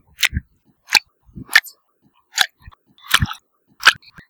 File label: tradeskill fishing